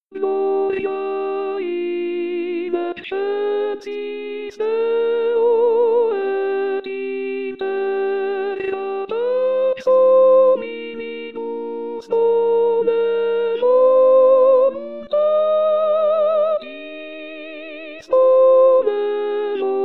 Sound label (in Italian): Gloria Ritornello Tenori; messe